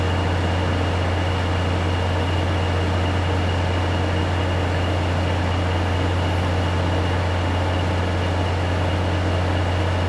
DRIVE MOTOR; SIMUZENSOUND

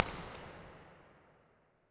Church, Hybrid Reverb; iZotope Hybrid Reverb

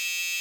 MOTOR ELECTRIC; SIMUZENSOUND

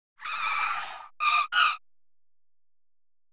COLLISION WHEEL CONCRETE, Wood Skid Dry; SIMUZENSOUND

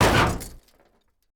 COLLISION HIT MID

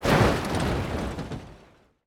COLLISION CRASH LITE; SIMUZENSOUND; Collision Lite Bass

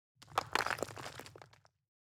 COLLISION CONCRETE; SIMUZENSOUND

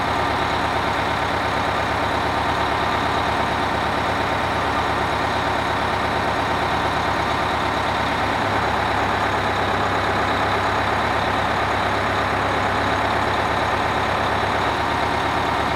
BUS IDLE; SIMUZENSOUND

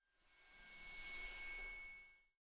BUS HYDRAULIC DOOR; SIMUZENSOUND

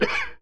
COUGH MEN; SIMUZENSOUND

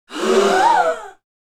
CROWD SHOCK; SIMUZENSOUND

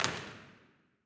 Auditorium, Hybrid Reverb; iZotope Hybrid Reverb

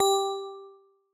BUS STOP BELL; SIMUZENSOUND

